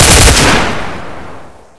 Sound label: m16 burst